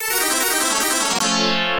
Has Failed; RoboCod; James Pond II Codename Robocod; Genesis

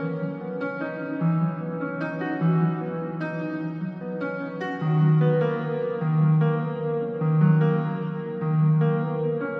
Piano Layer

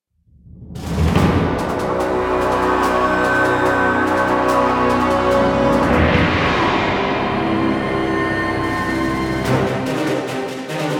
Combat Dogfight; Elite Dangerous

